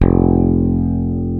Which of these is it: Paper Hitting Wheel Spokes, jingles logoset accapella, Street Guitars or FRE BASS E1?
FRE BASS E1